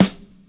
sizzly snare g key, Royality free steel snare drum sample tuned to the G note. Loudest frequency: 1893Hz